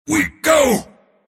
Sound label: move pain; Mars